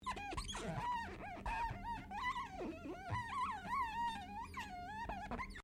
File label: GLASS SQUEAK RUBBER SEQUENCE